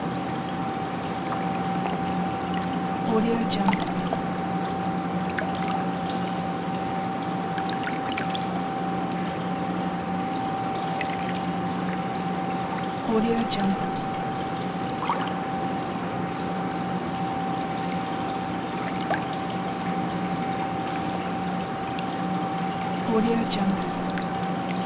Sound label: sports swimming water laps pool filter; human sounds; آمبیانس محیط استخر